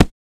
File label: Short foley